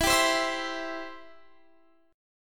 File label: E-Minor Sharp 5th